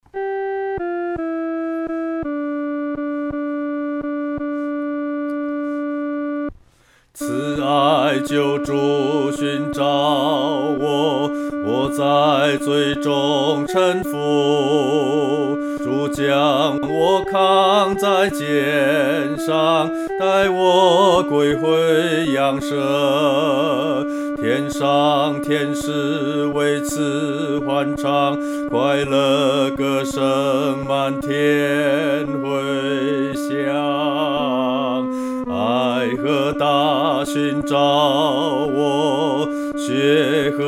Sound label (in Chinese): 独唱 第二声